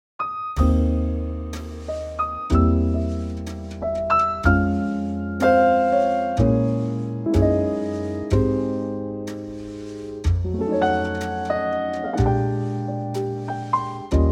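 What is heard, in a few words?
trio A flat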